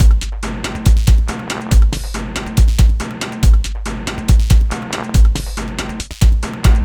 Drums, Loops; Trip-Hop

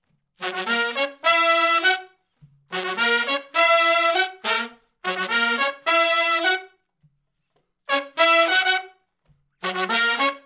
R&B Riff (F#) unison, Horncraft

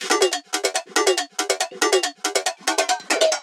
Fake Neo Digi Fu, bass

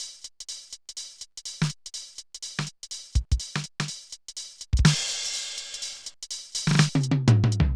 Loop, mxr; classic machines

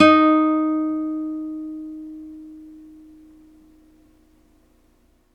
nylon P D#3, guitars; Synclavier Sampler Library

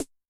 Analog H, Yamaha DTXpress-II snare

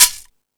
Timbale, E-MU Pro-Cussion

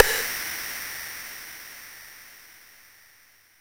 Rimshot, Roland JX-305

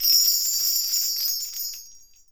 BELLTREE; ILIO; Synclavier Percussion 2; World & Orchestral

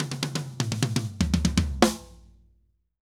Drums Poly 120, Drums & Percussion; Best Service; Festa Latina VOL-1

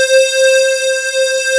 RICH WAVE; AKAI S-Series CD-ROM Sound Library VOL-8; S-PAD

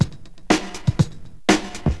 Simple Break, breakcore

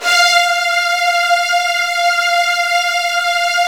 F2, Virtuoso Strings; ILIO; Kirk Hunter Virtuoso Strings VOL-1

